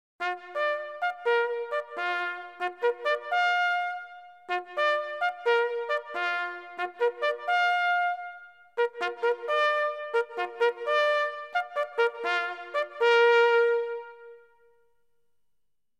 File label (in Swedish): Krigsskolans igenkänningssignal